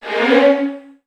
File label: VN FX, Virtuoso Strings